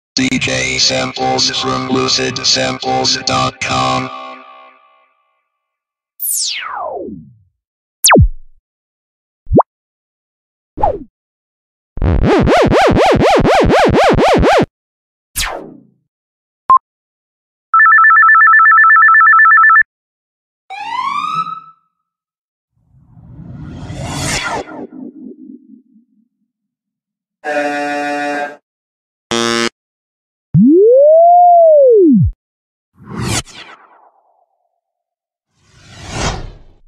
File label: DJ